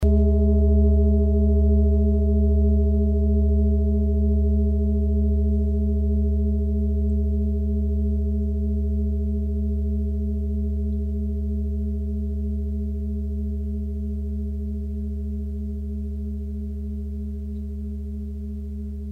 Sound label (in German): klangschale nepal, Klangschalen Nepal